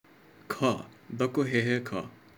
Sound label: heh heh kha